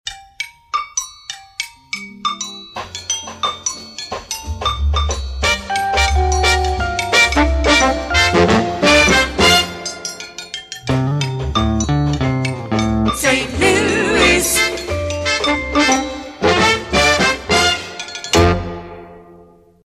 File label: Cowbell Latin Instr; PAMS; KXOK